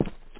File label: footstep; fear